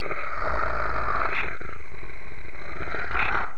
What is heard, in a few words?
noise low growl; Bionicle; rahi